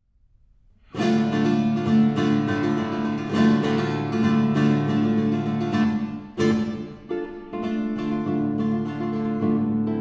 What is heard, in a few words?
Rumba; guitarist